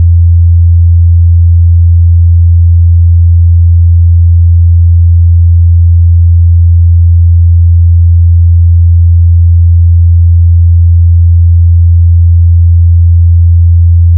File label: 85 hz